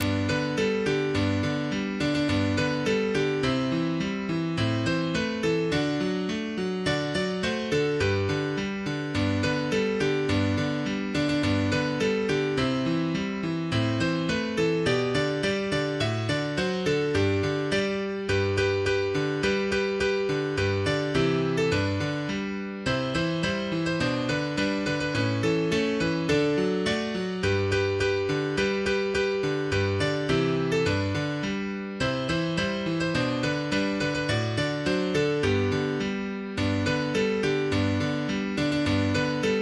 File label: Keyboard Jingel bells